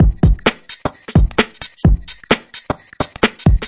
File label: twostep skipper, drum loops and breaks